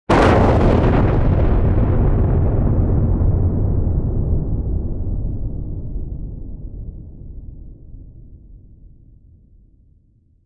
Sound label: Explosion Long Reverb